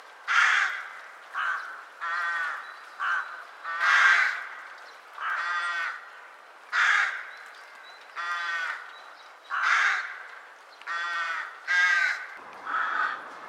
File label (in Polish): glos gawrona Corvus frugilegus